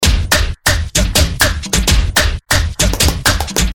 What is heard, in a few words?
Beat